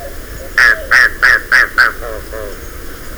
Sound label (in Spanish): pajaro bobo menor; Los Colores que Cantan